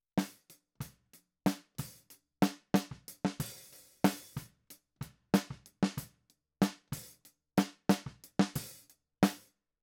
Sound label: Snare